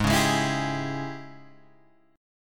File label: G sharp Major 7th Suspended 4th Sharp 5th, Guitar, Open G